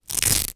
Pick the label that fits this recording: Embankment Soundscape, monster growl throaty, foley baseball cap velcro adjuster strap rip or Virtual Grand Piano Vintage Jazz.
foley baseball cap velcro adjuster strap rip